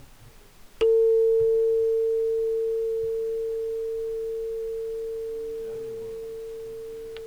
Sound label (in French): diapason